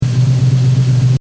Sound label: Engine: Tick Over Revs Loop, car